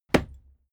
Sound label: fight impact sweetener cloth; nahkampf; soundpool